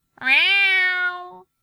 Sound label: neko